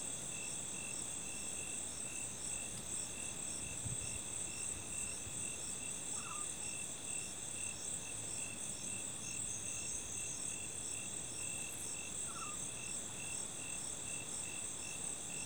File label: ambience night 400 cent